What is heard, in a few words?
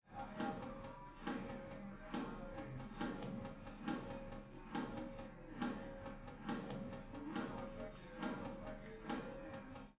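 with drums, live rec; SiSEC11; underdetermined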